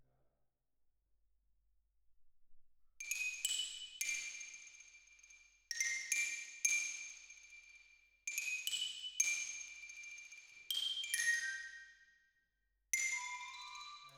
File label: Xylo